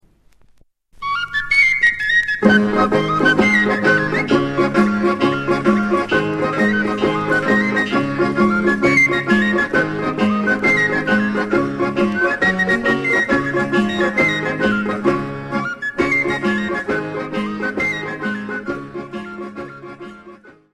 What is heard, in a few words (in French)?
Italie; U Chiove